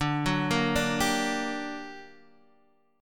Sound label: G Minor Major 7th, D, guitar chord